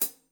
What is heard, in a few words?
hihat, drums; SimpleDrums